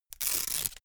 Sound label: velcro large open